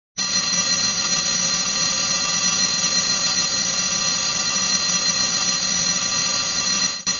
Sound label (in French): sonnerie reveil